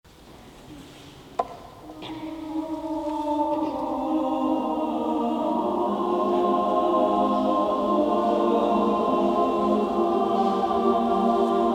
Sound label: a choral amen